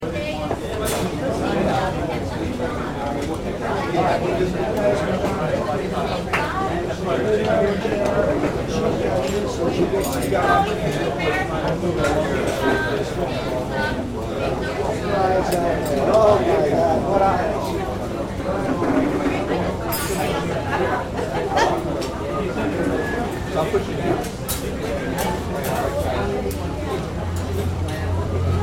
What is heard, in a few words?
crowds cafe